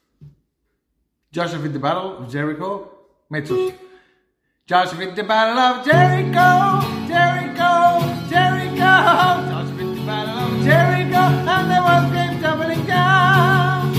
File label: joshua fit the battle of jerico mezzos